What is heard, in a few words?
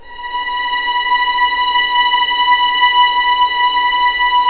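B5 legato mf violins R; East West; The Ultimate String Collection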